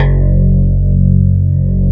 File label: CLAV, FUNK FM CLAV; East West; Twisted Textures-BT